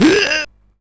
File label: YM Vox Vom; ChipShop; CHIPSHOP DELUXE; CHIPSHOP HITS